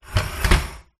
household bathroom scales slide on hard tiled floor